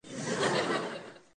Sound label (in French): rire sitcom